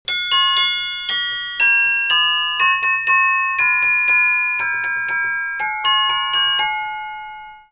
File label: celesta